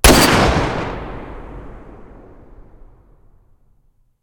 svd muzzle mono, weapons

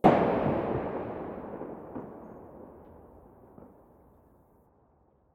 mdr silencer mono very far, weapons

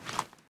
aim in, weapons; STALKER; IWP

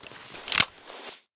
abakan gl deactivate; STALKER; IWP

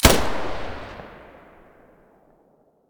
muzzle vector stereo, weapons

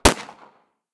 glock17 muzzle mono far

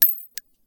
shell 39mm default; STALKER; IWP

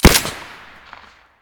saiga silencer stereo, weapons

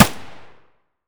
pp1901 muzzle mono distant; vityaz

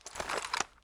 shotgun holster; STALKER; IWP

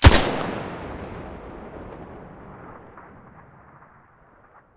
scar muzzle mono

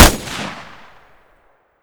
pkm shoot; STALKER; IWP

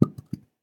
Shell 12ga carpet; STALKER; IWP

